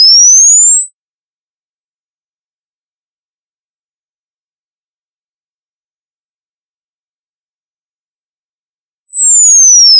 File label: sine test